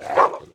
enemy fire fox melee

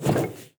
tower necromancer basic Attack bolt Summon

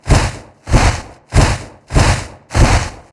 fly loop

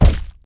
shot hit

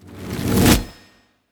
ice bolt shot; arivan

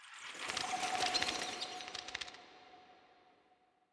Ambient bats

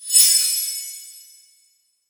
solo chimes; faery dragon; extra ability

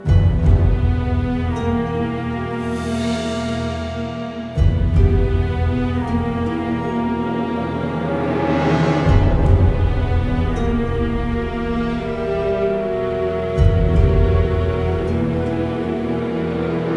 boss victory

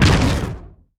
heroes bonehart basic attack impact

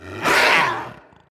growl; bittering rancor; drizzt

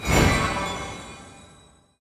event horizon cast; tower; elven stargazer

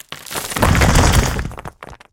efreeti doors

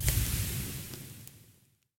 campfire off; sfx

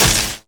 spiders tower heode ray

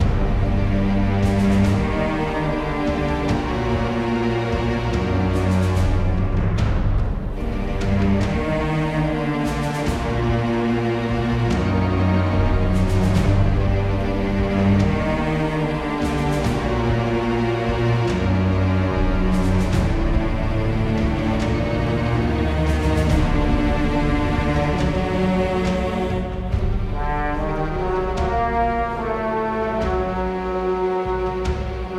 bg music preparation

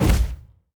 enemy bound Nightmare death; sfx

